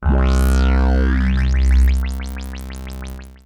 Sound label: F C2, synth; Roland S100; musicradar-synth-samples; Roland F Multi